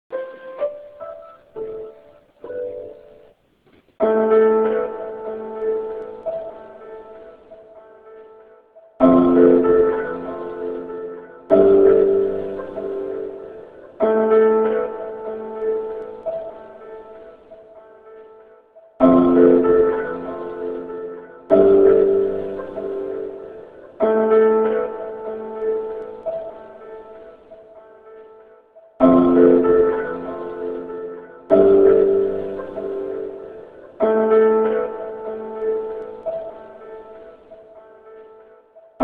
Lo-Fi Incidental

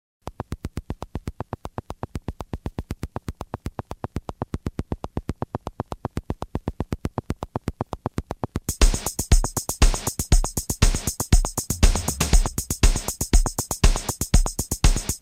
pop sub test

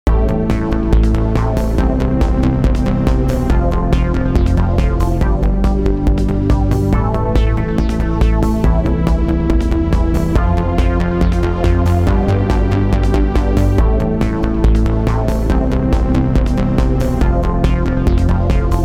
loop

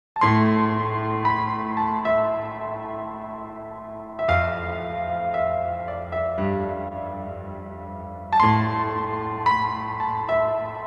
piano solo

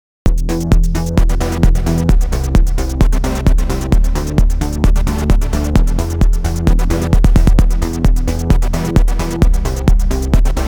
Techno

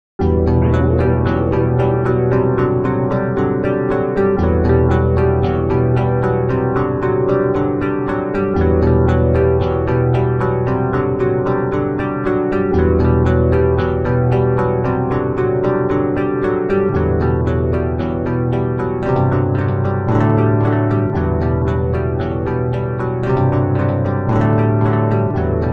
fake guitar

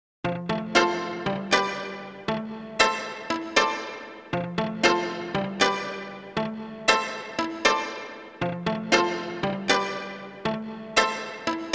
Violin Test Seqs

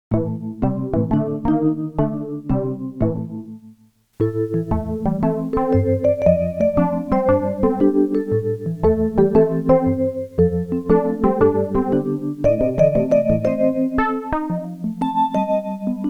incidental

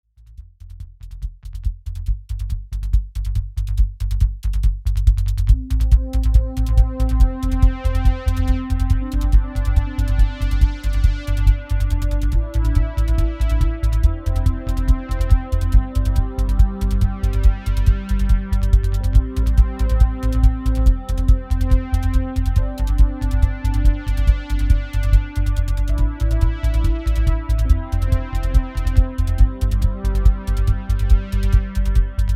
Moog Jam

